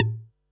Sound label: Perc, Percussion and FX; Pearl SC-40